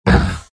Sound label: pain, vo; arc warden